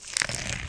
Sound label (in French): Ecrasement de quelque chose